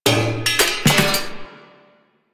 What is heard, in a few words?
Race Music Ending; Warframe; DE; K Drive Races; Fortuna